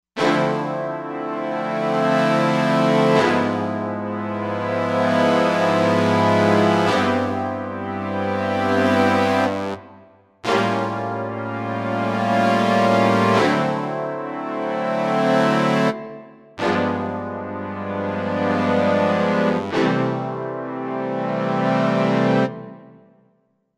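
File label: Brass Sforzando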